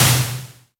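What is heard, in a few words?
White Noise, Roland SH-32 Drumset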